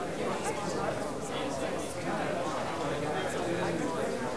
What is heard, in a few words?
song; bugworld